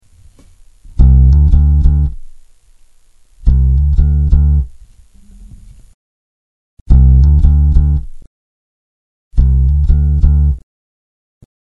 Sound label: gate; ak-workshops